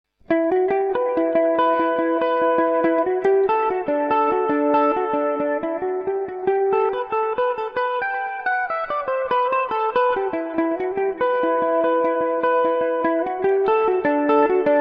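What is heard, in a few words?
E minor revelation, Irish mandolin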